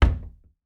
Cabinet Door Close; Doors & Drawers

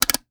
Switch

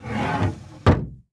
Desk Drawer Close; Doors & Drawers

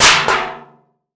Metal Strike

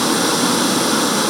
Static Loop

